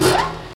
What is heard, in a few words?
machine start